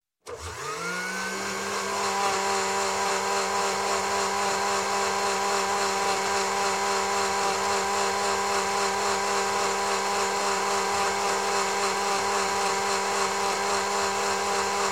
Blender, Electric Start, Run, Stop, Liquid; Sound Ideas 2000 Series

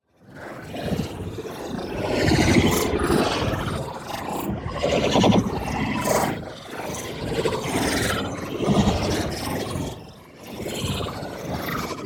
PARTICLES Time Distortion; boom library; Matter

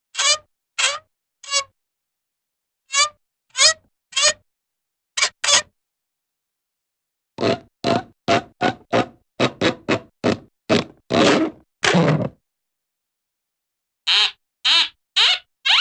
Various Funny Creaks & Squeaks; Cartoon; Hollywood Edge; Cartoon Trax

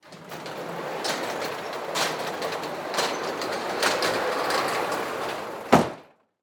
Semi Truck Garage; Blastwave

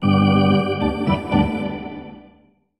Hockey Organ

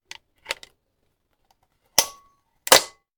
Machine Gun Foley; Sound Ideas; Guns

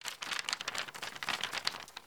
Paper Airplane Fly; SFX Kit; Foley - Misc

